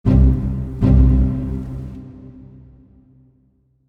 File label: Pizzicato Mini Bumper